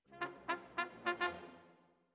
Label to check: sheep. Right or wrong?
Wrong